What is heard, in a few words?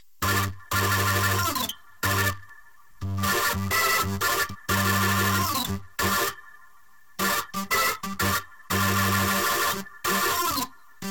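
wet organ, Multiband neural audio effect; prism distortion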